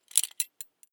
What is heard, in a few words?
metal belt buckle clatter heavy